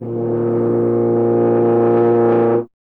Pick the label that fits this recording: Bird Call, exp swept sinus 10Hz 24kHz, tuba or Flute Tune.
tuba